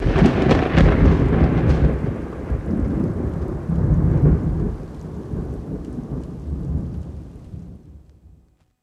Thunder Clap Mellow

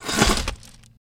Hurling Food, food; Hurling Food At Hollywood Whores

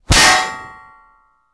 Bullet Impacts Metal

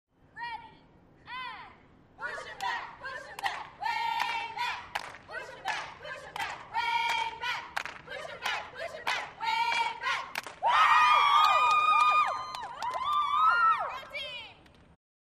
Cheerleaders Cheer

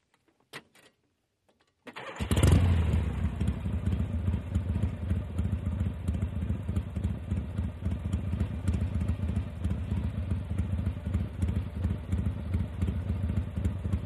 M Cycle Harley Kick St

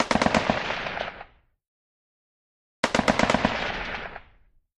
AK47 Various Bursts

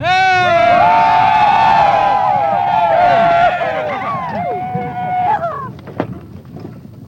Army Yells Cheers Hoo, Civil War; Civil War Battle Background; Lots Of Voices, Guns And Explosions

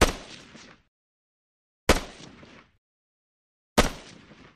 Russian SKS Single, sks 7x6

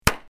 Plastic Pops, bubble-wrap

